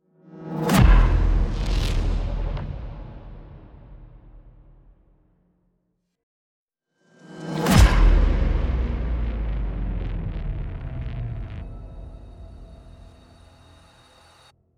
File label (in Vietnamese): tieng am thanh het video xuat hien logo